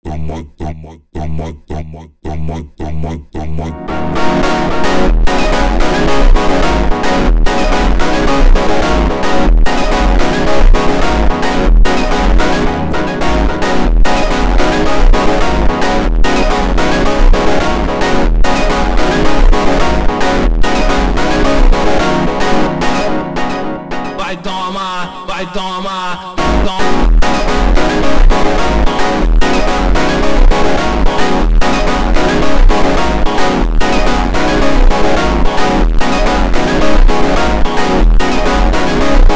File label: ultra slowed